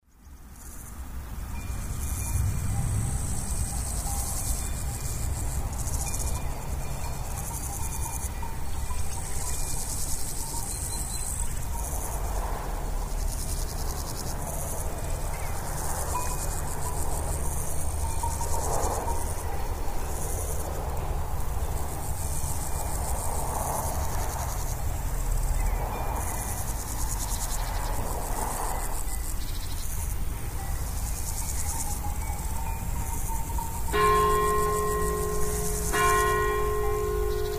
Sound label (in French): clocher noyer